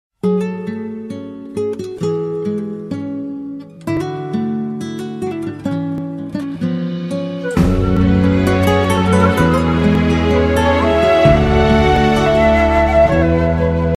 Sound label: beautiful tone